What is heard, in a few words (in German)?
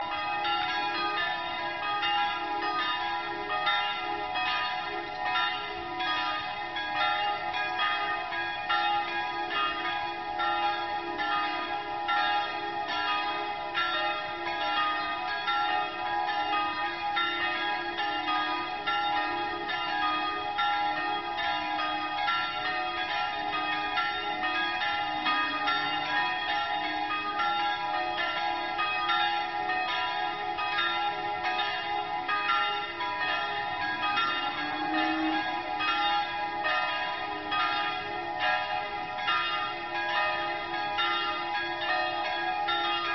glocken